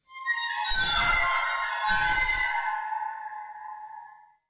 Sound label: Waterphone